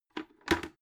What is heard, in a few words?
bucket handle drop